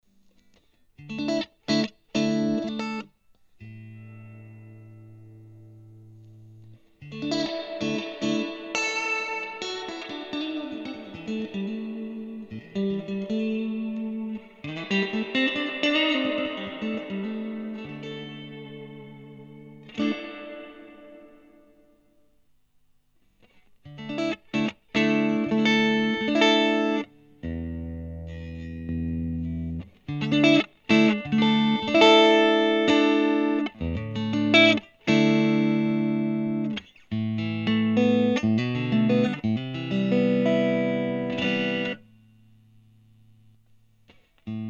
Fender Blues LTD FAT Hall

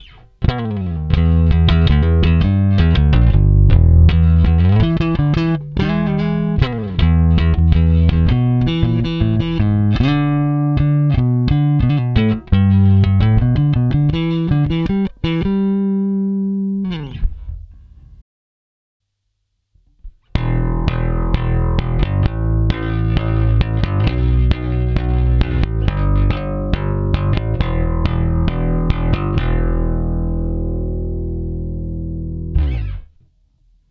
DI Post Compressor

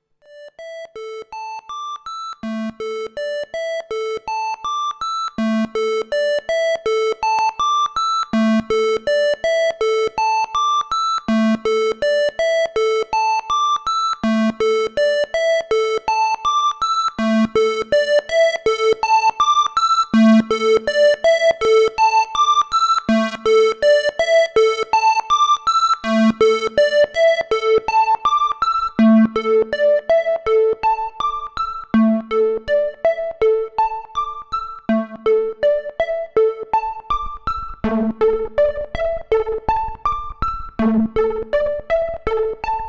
PWM Malevolent Arp

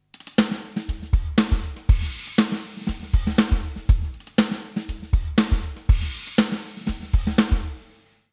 studio drums attack non lin

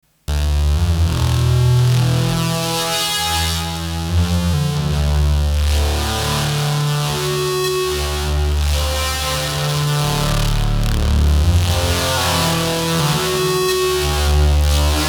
Korg Wavestate MkII Feedback Lead Factory Sound

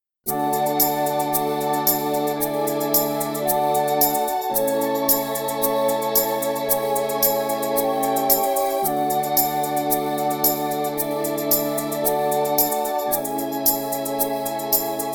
Mellotron Pop

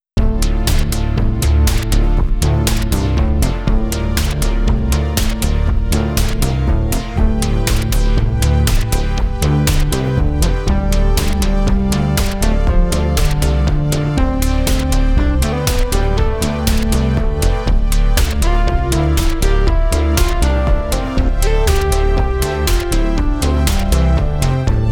ARP2600M multitrack

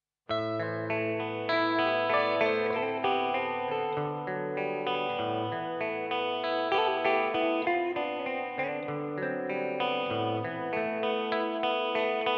Room Reverb GFI Systems Specular Tempus

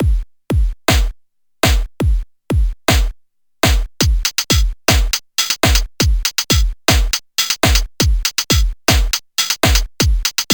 Soulsby Oscidrum Drum Set

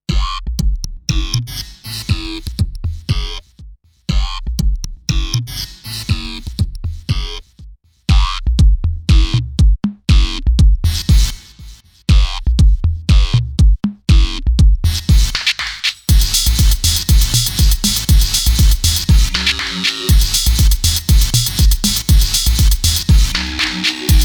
Electro House

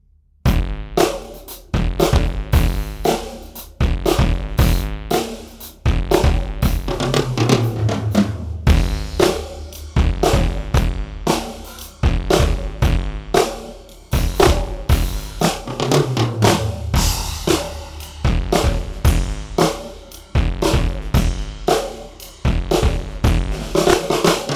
Brass Swirl

